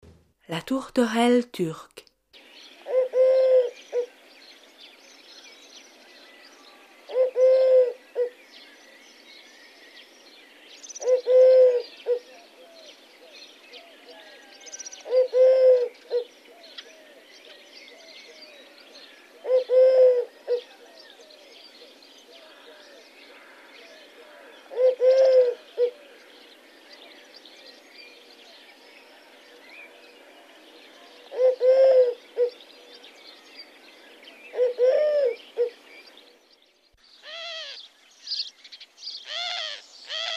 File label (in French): Tourterelle Turque